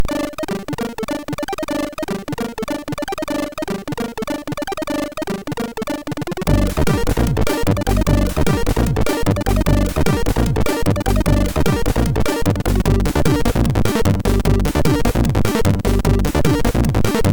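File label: Folk Tech, CSG MOS6581R4; hvsc; Froyd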